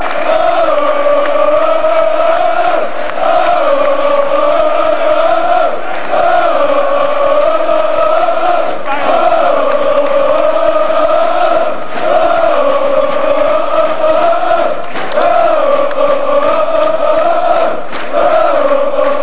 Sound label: goal tune, fanchants